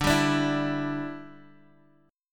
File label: E Minor 7th D